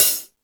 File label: High Hat, E-MU Pro-Cussion